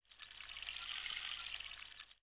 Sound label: rainstick short